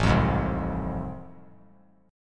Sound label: A Minor Major 7th Sharp 5th; strummed; AmM7#5; 9,12,11,10; JGuitar